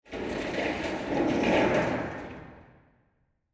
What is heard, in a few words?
hangar layer